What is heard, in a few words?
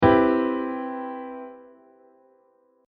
C maj7